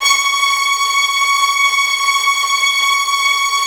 STR TREMOLO, Strings

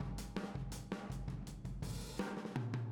Boogaloo Fill Room M, 82 BPM, Drums

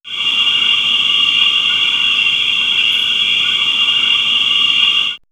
Nature, Nighttime; X-Static Goldmine

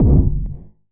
Kick, Gated Reverb; musicradar

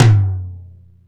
CONG TOM, CONGA BONGO

